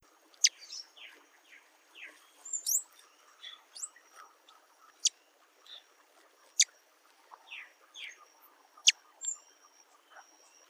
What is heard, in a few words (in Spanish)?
picaflor vientre negro